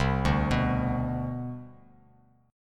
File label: C Major 7th Suspended 2nd C down, Bass Standard, chord; CM7sus2 arpeggiated; JGuitar